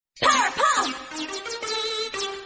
Notification; Powerpuff

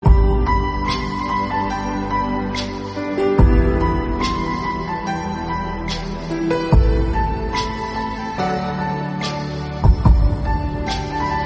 Piano Cover

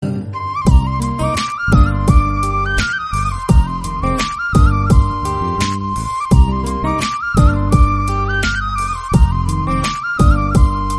Flute BGM, ringtones

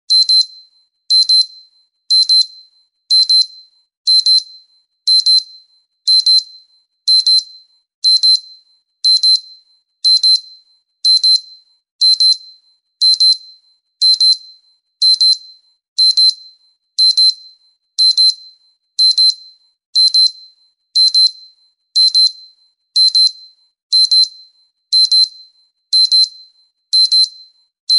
Beep, Sound, Alarm Tune

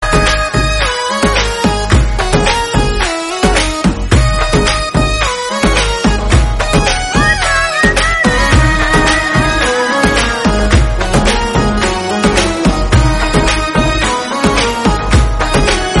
Instrumental BGM

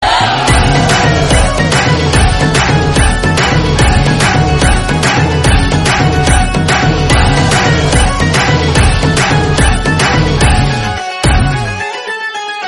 BGM Instrumental

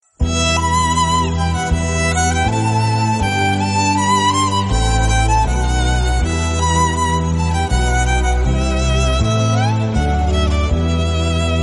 Flute, Telugu, ringtones

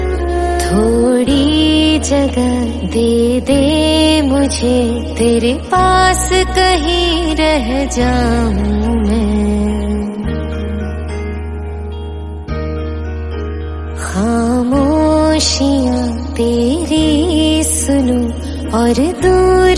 Female Version

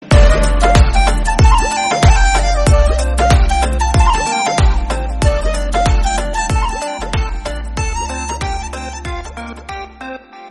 Instrumental BGM

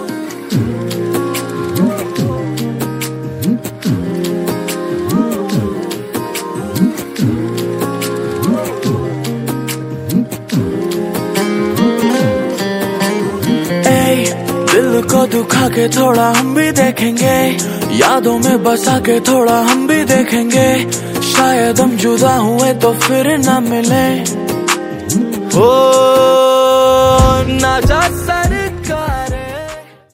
Instrumental

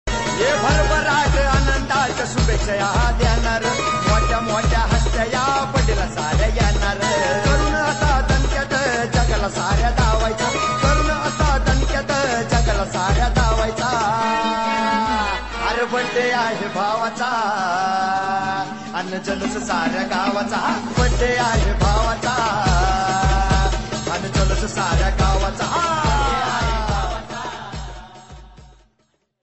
Birthday song

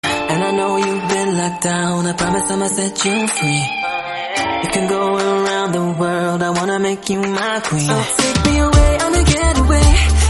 Punjabi Song

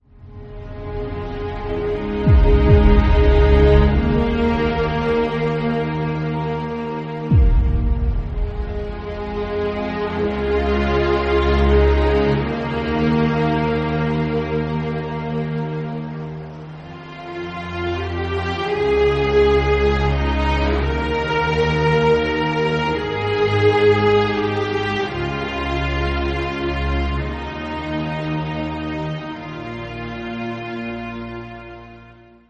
Theme Bgm Instrumental

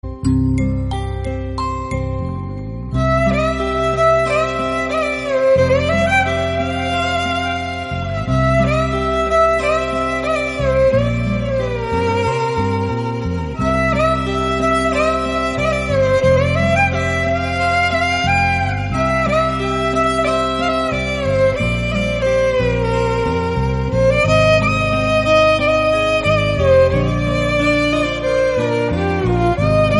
Bgm Flute Telugu

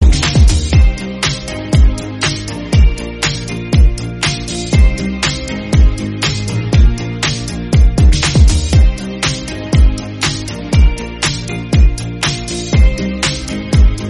BGM Instrumental

